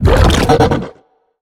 Ability Tongue Grab Tell; Evolve; Behemoth

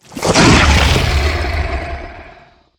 Sniff, Behemoth; Evolve

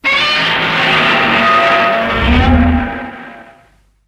Roar; Shin Godzilla

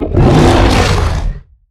Pain Light; Evolve; Behemoth

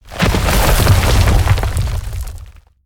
Rock Throw Grab; Evolve; Meteor Goliath

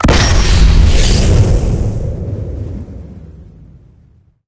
Death Wall Fire; Evolve; Elder Kraken

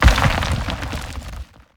Leap Attack Impact Debris; Evolve; Goliath

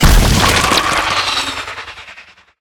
Ability Gooberball Impact; Lurker; Evolve; Gorgon

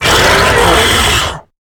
Harpooned; Lurker; Evolve; Gorgon

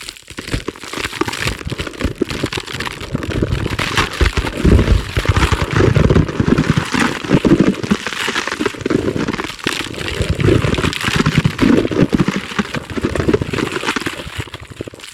Ability Spirit Walk Crunch Loop; Lurker; Evolve; Gorgon

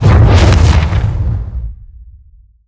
Mortar Cannon Fire; Evolve; Behemoth

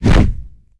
Whoosh Arm; Evolve; Meteor Goliath

